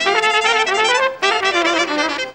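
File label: clarinet trumpet; Best Service; World Colours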